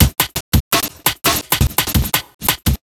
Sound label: Break, Drum N Bass Percussion